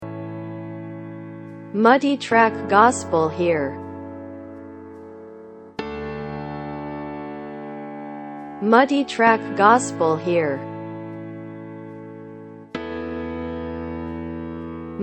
Keys Piano

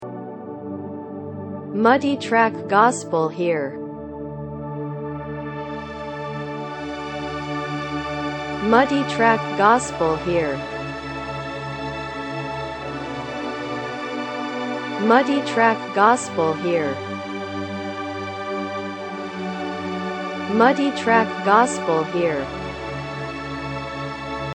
PAD

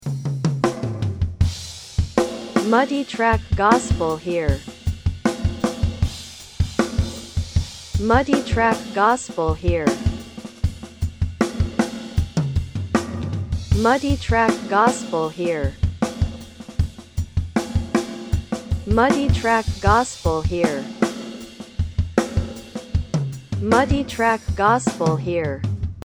DRUM

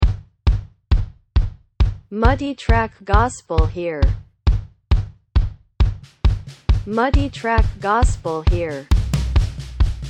DRUMS

